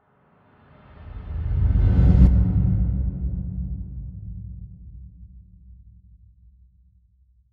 Midnight Rise

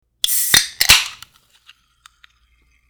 Can Opening

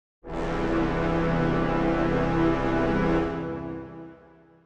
Dramatic Brass

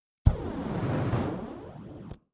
Fly By Bomb

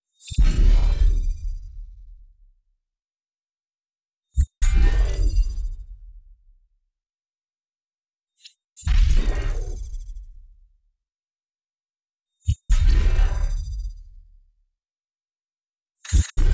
Electro Swirl

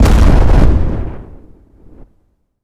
Explosion Strong

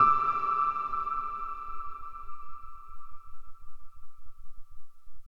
Piano Reverb High Ultra

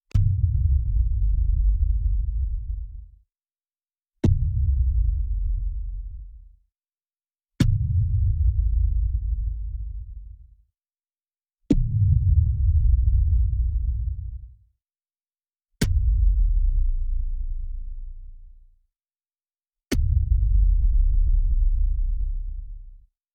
Impact Thud Nock Periodic Rising

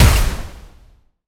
Short Impact

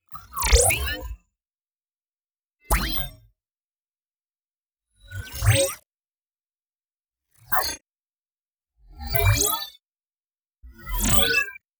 Ultra High Splash, Electro

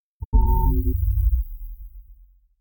Robo Body Periodic High Ultra

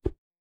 Punch Swoosh Thud; Hit Whooshes

